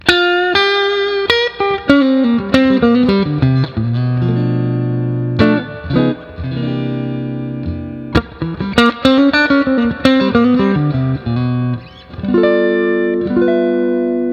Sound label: 56 neck